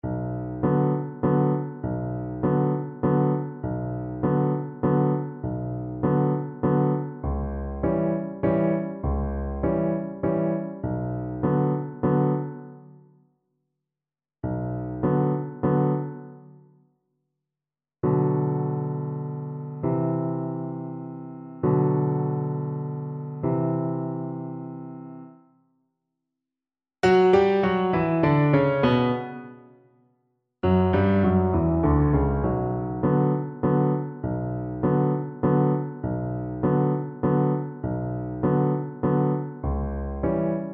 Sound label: creak crunch scream viola